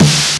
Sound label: Snare; VEC1; Club